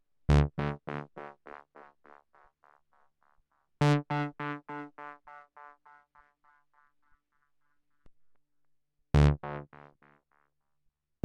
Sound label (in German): Delay mit Filter HP BP LP Notch